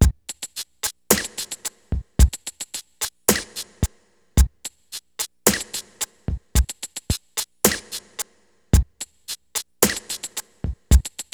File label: DRM, Hip Hop and R&B; Best Service ProSamples vol.02; AKAI